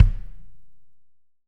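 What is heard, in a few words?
AMB KI, ambient kick, studio; AKAI S6000 CD-ROM - Volume 3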